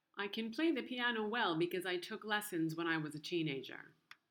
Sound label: play piano